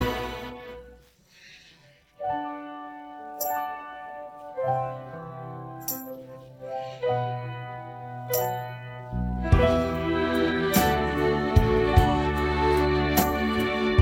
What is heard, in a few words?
beat